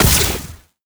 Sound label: Activate Tesla coil; boom karts multiplayer kart racing android